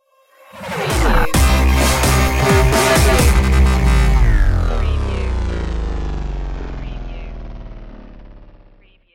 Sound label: destroyed stinger